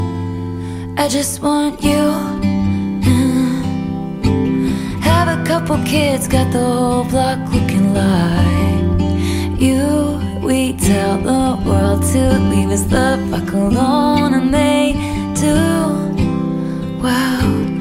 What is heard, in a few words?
Acoustic Version